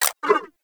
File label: Briefcase Akimbo Pistol Mech; Warframe; Corpus